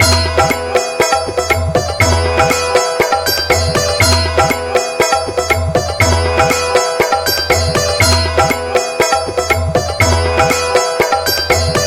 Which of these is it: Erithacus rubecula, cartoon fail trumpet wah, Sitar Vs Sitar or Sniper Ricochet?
Sitar Vs Sitar